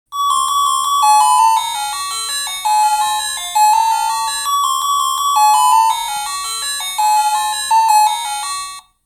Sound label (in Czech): melodie